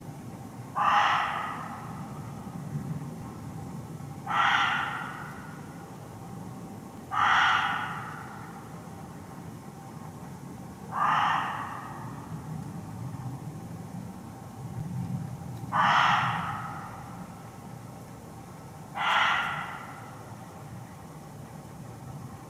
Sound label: Sounds of Stanwick Lakes Muntjac Deer